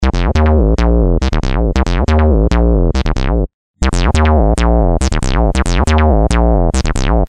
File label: feedback res